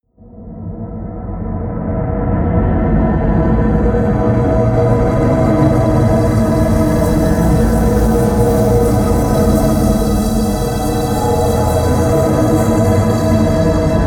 drone moving air high

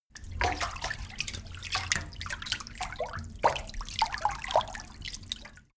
splashes, badezimmer

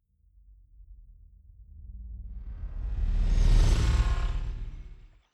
sci-fi vehicle train drive by hum low; raumschiff

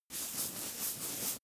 makeup cotton stick brush sequence; haus-garten; badezimmer

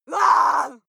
female lunatic scream short

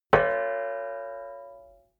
plate hit ring stopped soft

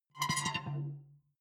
sci-fi alien console sparkle; maschinen; signale; soundpool

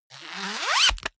zipper pullover jacket close accelerate; objekte; reissverschluss

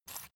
salt mill squeak soft; berufe; gastronomie

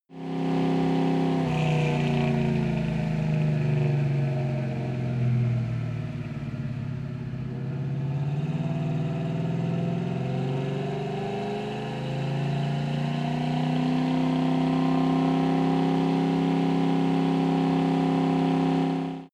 car camaro decelerate accelerate exhaust

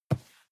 cardboard box medium grab slide; objekte

